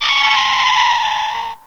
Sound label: gryphon shriek; wesnoth